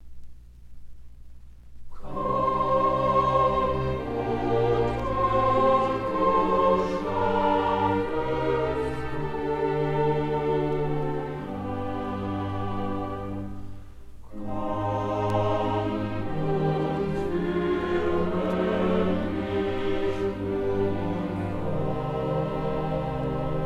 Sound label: Cantata No. 56 "Ich Will Den Kreuzstab Gerne Tragen": Chorale (Woodwinds, Strings; Woodwinds, Strings & Continuo; Johann Sebastian Bach